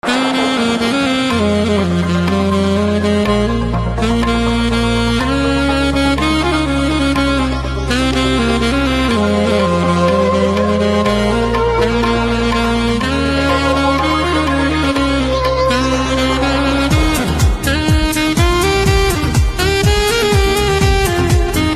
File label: Saxophone